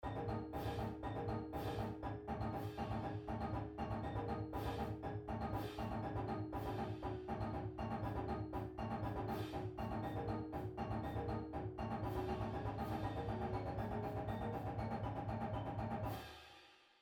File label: Diddles, Drumline Exercise; Rennick; pdlmusic; Drumline Ensemble Series